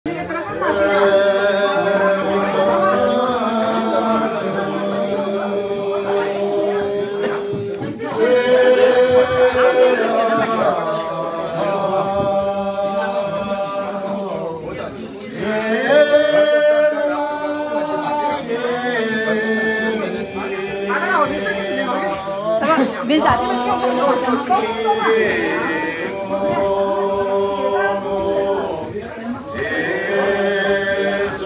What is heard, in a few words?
gyakar penje for female, mantras; Namche Bazaar